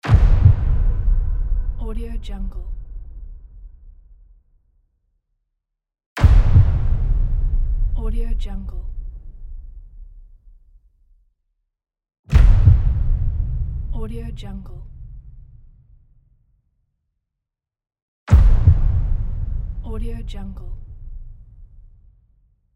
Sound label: heartbeat impact; gamechestaudio